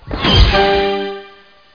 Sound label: rings; Stanley's Sticker Stories (1997)(Edmark)